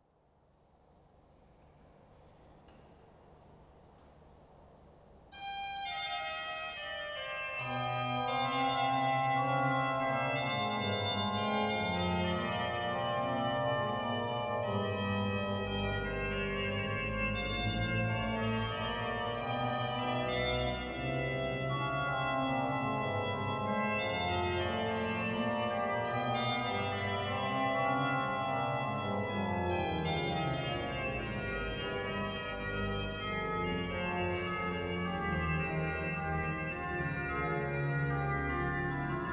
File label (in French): Jeux de Tierce improvisation